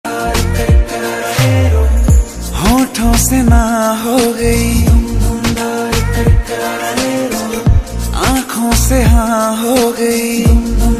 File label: romantic song ringtone hindi song ringtone